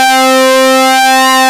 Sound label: NEW R SYNC, ARP Synths; Analog Odyssey; E-MU Formula 4000 Series Vol. 3 – Analog Odyssey